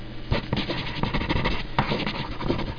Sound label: Writing a Note